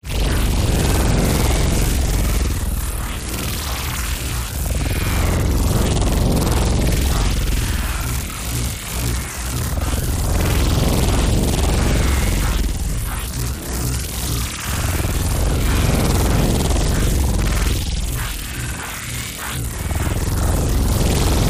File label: Particles Large Slow, Sci-Fi Sound Effects